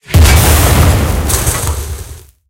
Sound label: tank hit heat mid; tanks; War Thunder